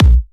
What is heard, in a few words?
Bassdrum; Vengeance Samples